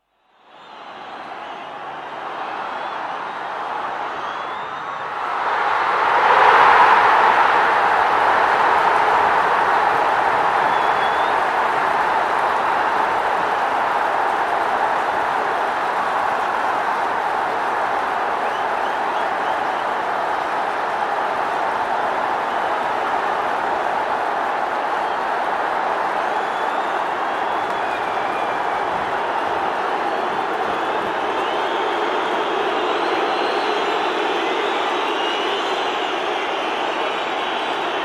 FA Cup Final, reaction to goal scored and boos from opposition; 1989 FA Cup Final, Liverpool v Everton; Crowd; BBC